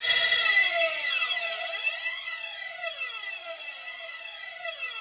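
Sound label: Yamaha VP-1, rough strings E4; 90 s Sample CDs; Music Store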